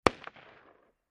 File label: M-16 Short Barrel Machine Gun Single Shot From Distant Point of View